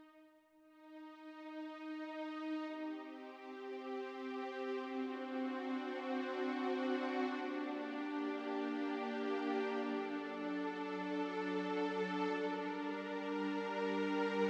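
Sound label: Legato Strings; XG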